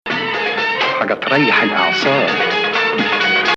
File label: Hijaz